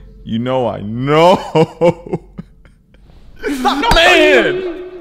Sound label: echo hurt